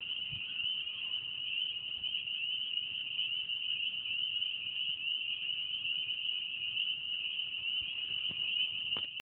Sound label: peepers